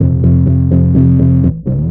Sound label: bass black petal; Loops; Phonky